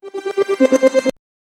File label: the successful exercise s, exercise